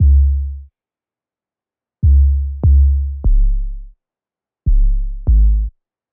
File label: Bass 148BPM G Sad Sub